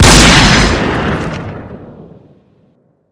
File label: sniper riffle; weapon; l115a1